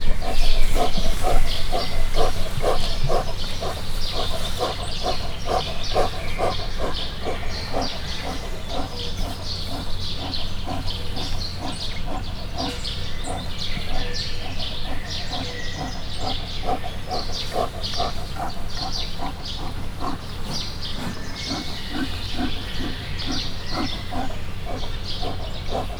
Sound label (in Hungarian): liheg a koma, barna medve; miskolc zoo